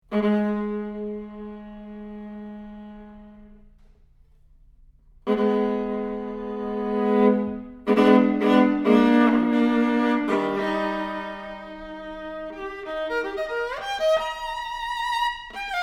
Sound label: violin